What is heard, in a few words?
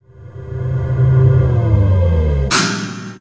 liftstop; vendetta